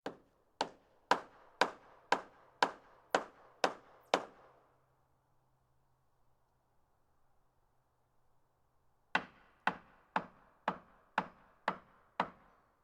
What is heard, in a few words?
hammering into wood